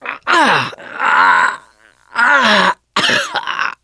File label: choke, mechanic; voice